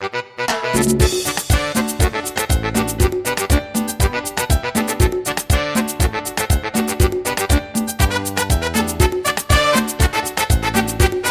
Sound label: merengue